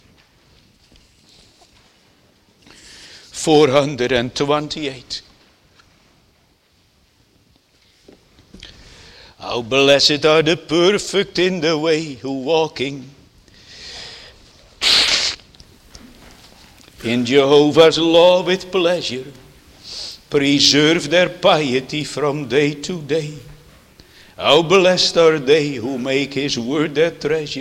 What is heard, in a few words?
Singing; The Lord's Incomprehensible Goodness; Netherlands Reformed Congregation, St. Catharines, ON